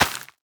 plant crop; minecraft

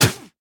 spit, llama; mob; minecraft

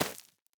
nether wart, plant; minecraft

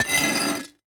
grindstone; minecraft; block

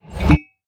fill dragon breath, bottle; Minecraft

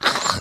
attack, rabbit; Minecraft

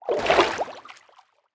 swim, liquid; minecraft

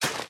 hoe till; minecraft

